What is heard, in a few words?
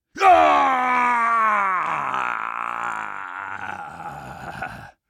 scream long, combine soldier; hl2